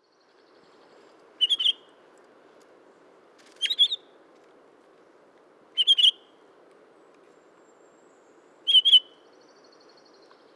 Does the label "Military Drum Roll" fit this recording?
No